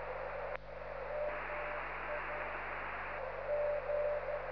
LF filter test

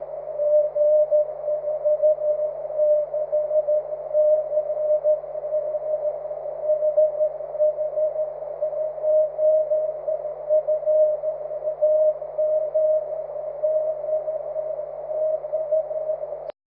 cw

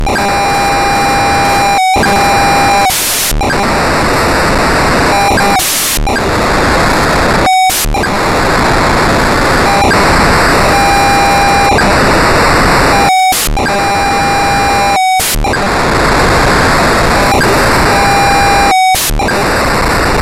FLEX

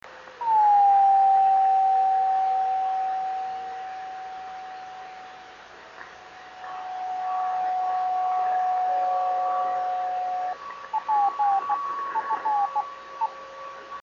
26595KHz

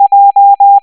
j tone

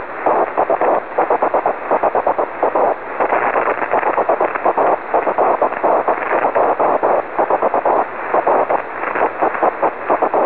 aurora cw

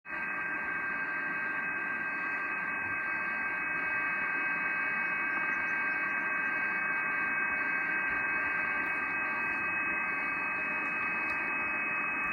qrm

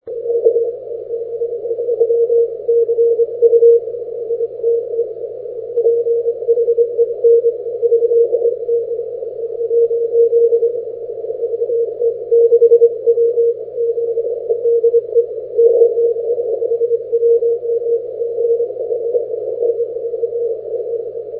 80m CW; 80m DXCC Entities worked from 2002-2008

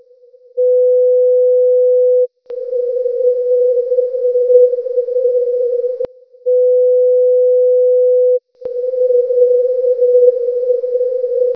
echo

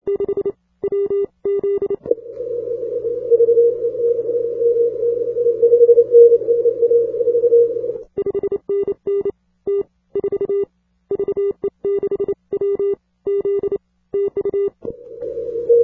80m CW; FT-1000d; CQWW CW; G0IVZ; 2004 CQWW Contest

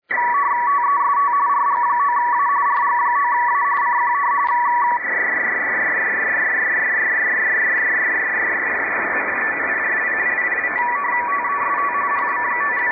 RTTY

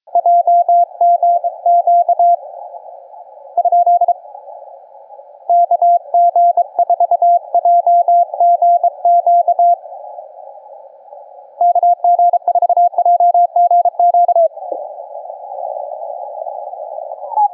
cqww cw

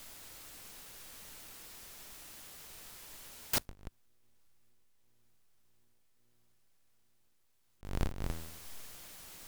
IQ; quetzal-1

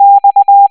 x tone

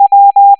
w tone

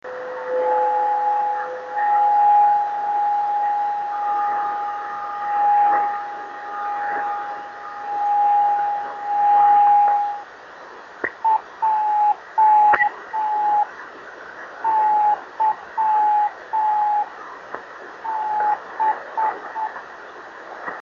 26795KHz

